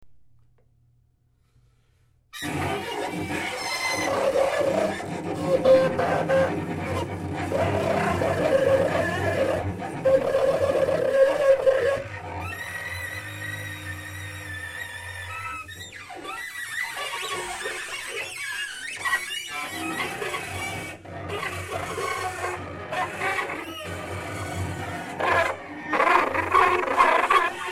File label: duet